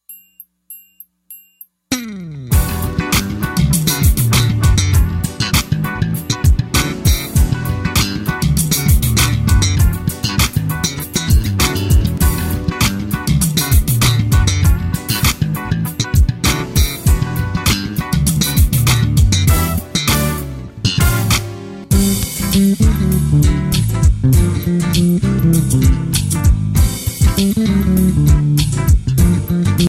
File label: Funky Sample